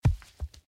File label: Small Stone; Blind Quest - The Frost Demon